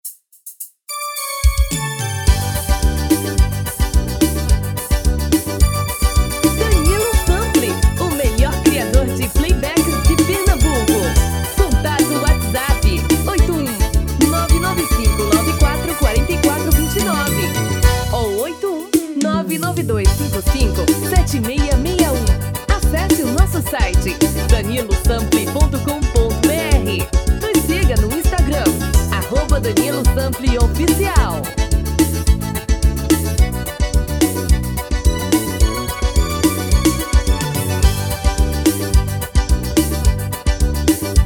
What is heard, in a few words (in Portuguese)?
forro das antigas